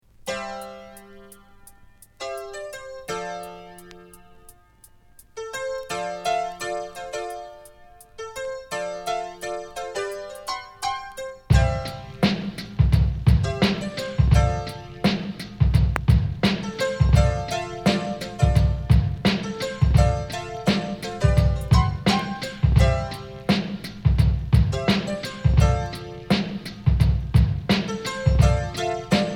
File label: Instrumental